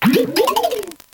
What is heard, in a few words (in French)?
Cri